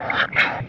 BELLOWS; Rarefaction; Poke in The Ear With A Sharp Stick

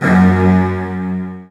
F#1, Strings; Virtuoso Strings; ILIO; Kirk Hunter Virtuoso Strings VOL-2; 90 s Sample CDs

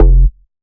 Bass F, Bass Synth

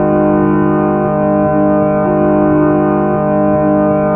Harmonium Sequence; Sonic Foundry (Sony Creative Software); Loop Noir; Session 12 - Otherworld Journey

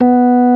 ORG. A#3; B3 FULL

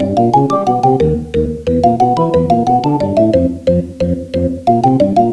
Cold Water; Hip-Hop Street Beats Construction Kit; Essentialsounds II

